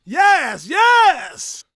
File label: SHOUTS, Gospel, PREACHER; Spectrasonics; Vocal Planet Vol.1 Gospel